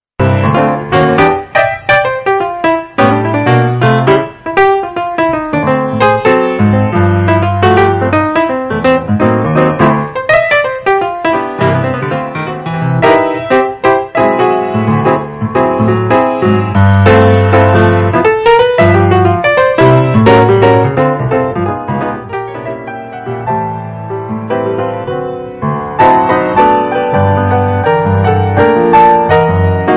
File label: solo Piano